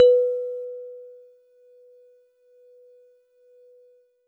Warble Celeste DX100 B3

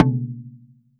Tom

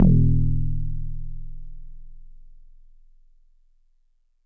Metal Tube DX100 E1

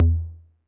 Tom Low 808 Sat, Saturated

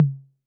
Tom Mid C 808

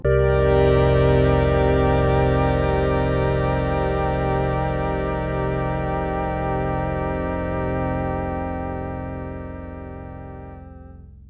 synth aqua chord spread

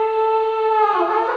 Dont Cry Trumpet A3

